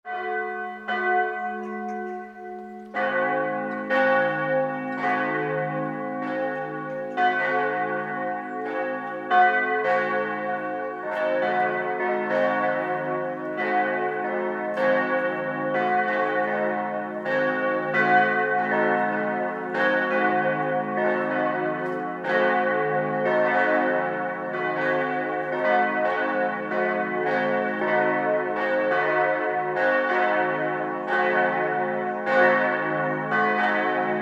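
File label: church bells calling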